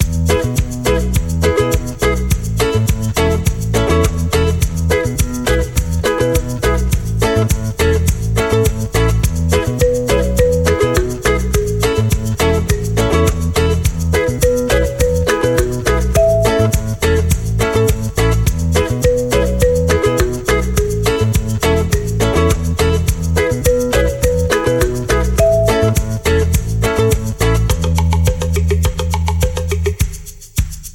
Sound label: instrumental happy